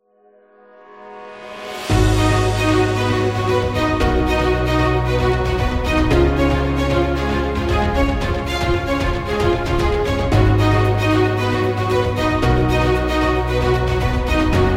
Cinematic Corporate

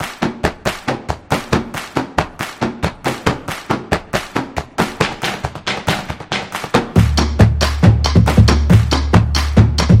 Vlog Opener; sports

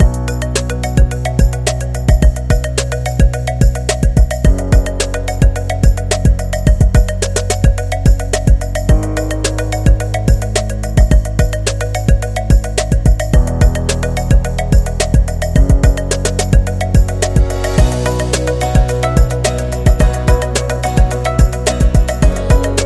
Luxury Lounge

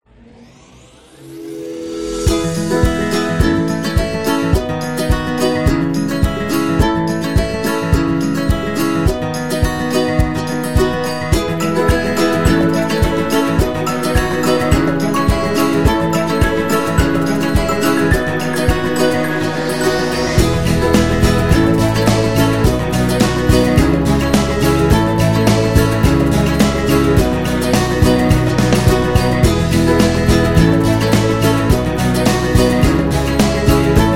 Comic, happy music

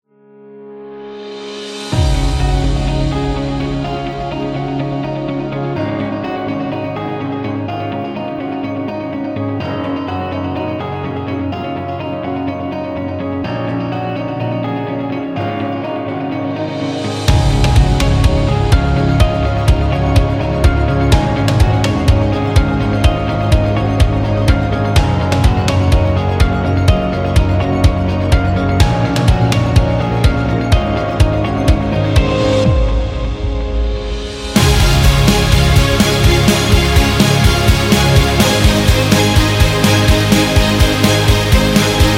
Dynamic Upbeat

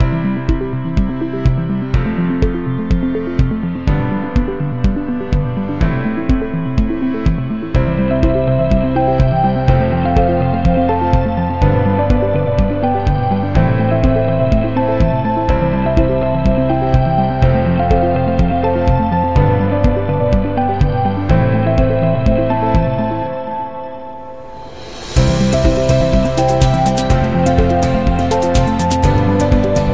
Minimal Background; corporate music vol 7

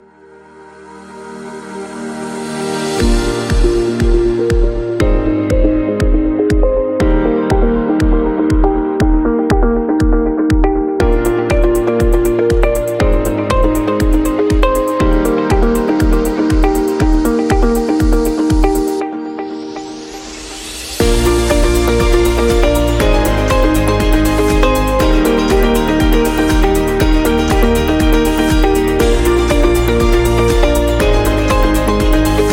Corporate Motivation; corporate promo

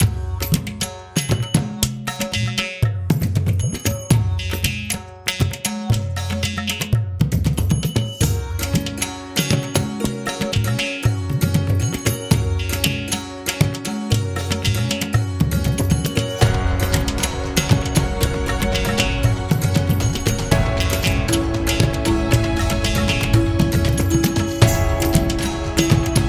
Upbeat India Travel

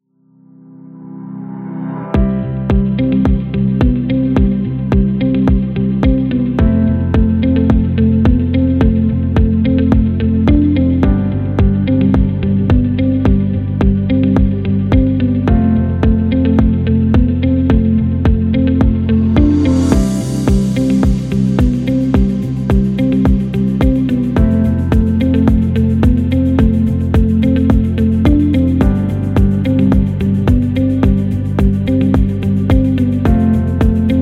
Ambient Music

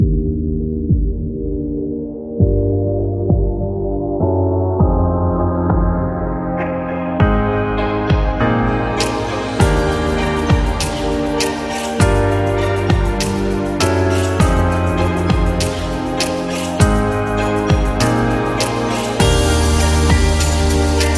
Lounge; corporate promo

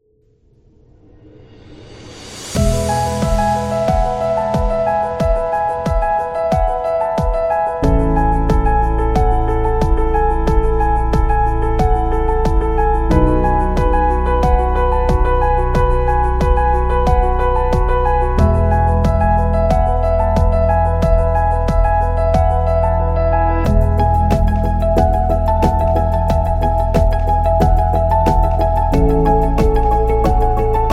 Inspirational Piano; corporate music vol 2

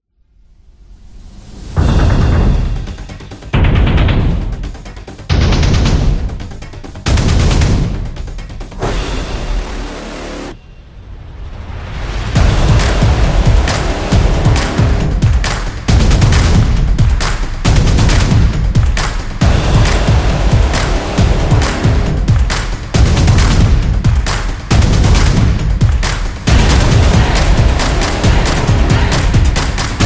Sport Action Drums

